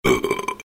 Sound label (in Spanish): Eructo